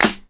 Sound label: clap snare; single hits